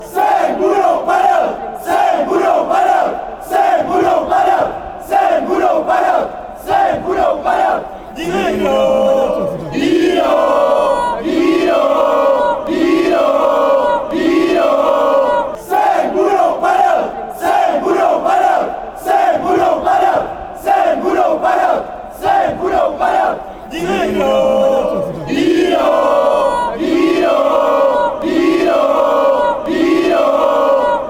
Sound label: chant, fanchants; bohemians